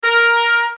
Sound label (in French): exemple brass; physical music; time freezer